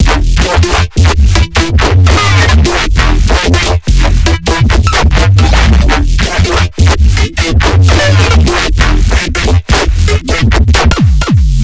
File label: bassloop Steppin E 165; base pack